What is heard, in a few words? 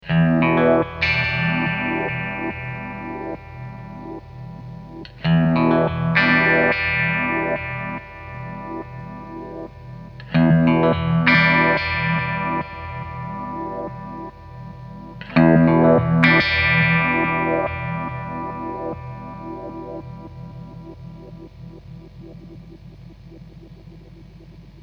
stingray multi filter; source audio soundblox 2